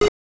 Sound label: fire; SpaceForce